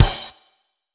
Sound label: DRUMLOOPS, Disco